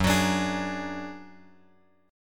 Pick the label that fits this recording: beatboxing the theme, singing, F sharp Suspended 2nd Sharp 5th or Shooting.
F sharp Suspended 2nd Sharp 5th